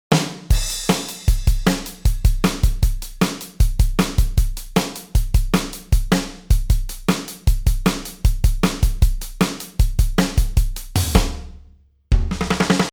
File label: Drum